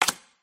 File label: card slap